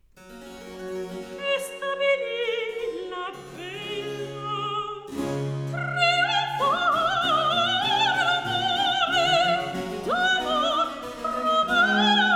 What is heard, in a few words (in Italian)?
Recitativo; che stabili la bella; Alessandro Scarlatti; Duet Cantatas